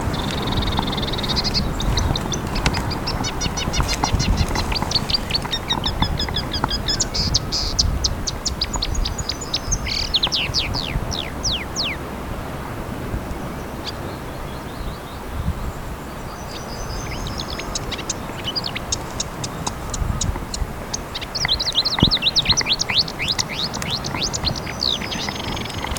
Cannaiola Verdognola

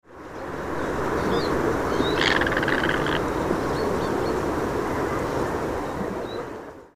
Airone bianco maggiore

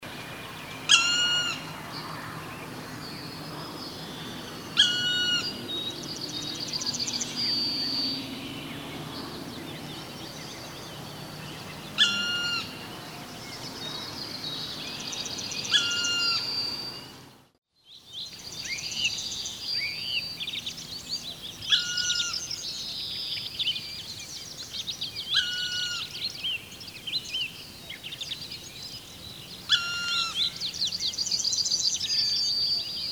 Picchio nero

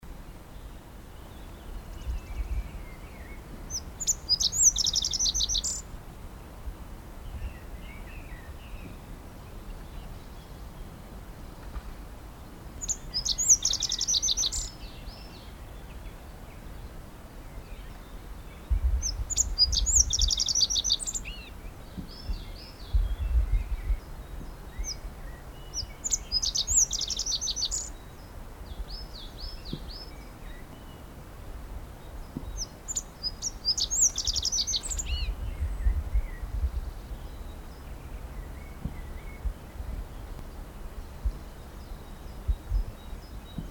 Zigolo muciatto

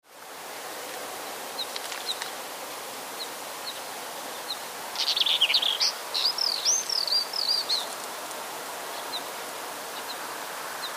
Monachella

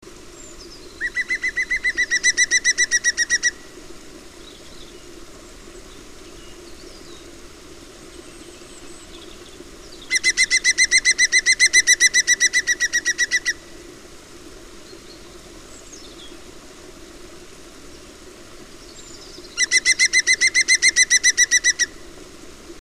Picchio Verde

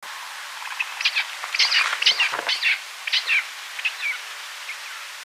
Pernice sarda

